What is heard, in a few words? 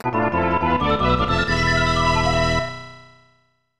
Tada; Heisei Shin Onigashima; snes